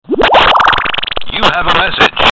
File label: U Hav a Msg